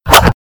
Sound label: miss